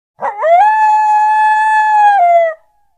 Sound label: Coyote Howl, Single. Medium Perspective.